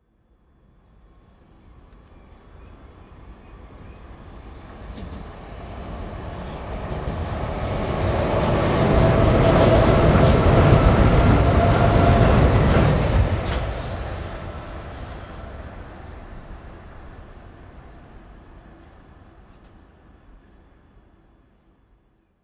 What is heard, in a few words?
transportation train electric overground pass under footbridge